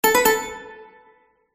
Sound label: announcement beep